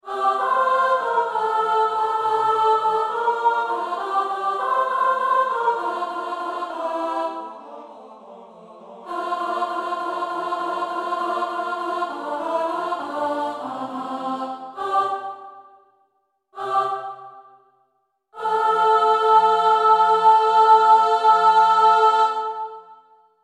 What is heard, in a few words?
Tutti Act 1 Finale Alto